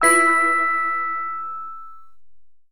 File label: alarm research tech; interface